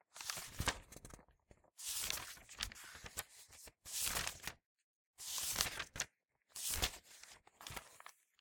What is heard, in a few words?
paper handling and turning sequence; fvtt sonniss gdc audio